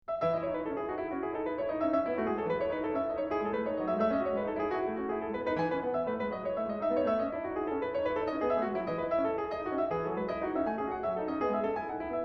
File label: courante; dancetudes